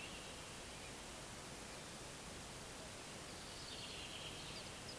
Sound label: Fringilla coelebs